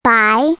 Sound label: buy